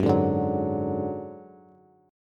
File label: Gb Suspended 2nd Sharp 5th Gb, down, Bass; strummed